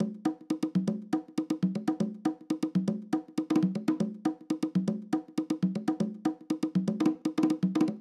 120 Conga 4 bars, Nu Jazz House; PowerFX

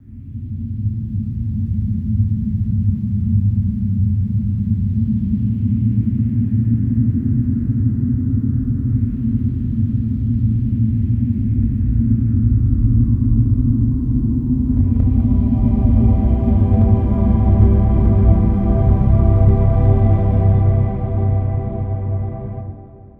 ambience

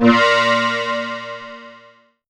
BELL-GONG, A#; Big Fish Audio

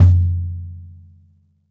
bop kick - snares on; JazzFunkKit

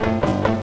The Weird Machine; Movie Bits

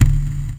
Into The Abyss, Kick Drums; Zero-G; Soundclash

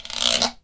GUIRO

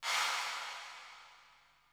REV, ROOMS

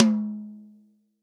TOM, Real Drum Kit, POP KIT

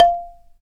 BALA, Balaphone

